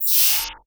Electric dis splash

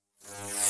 Electric dis hum

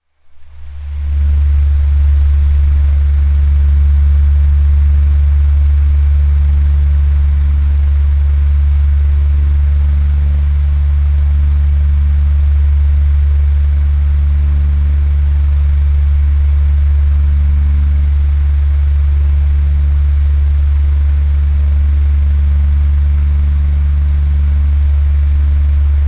fan up close

